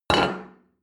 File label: plate place table hard short; sport-freizeit; restaurant-cafe